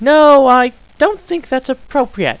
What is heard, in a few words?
ahh, scientist